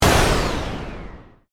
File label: Descend, Hit, Production Element, Imaging Element, Accent, Transition; Descending Sound Effects